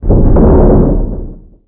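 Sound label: Asteroid Collision; Space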